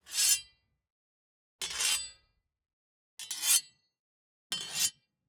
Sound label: Sword Scrapes Multiple, Battle, Metal, Slash, Draw; 344 Audio; Medieval Battle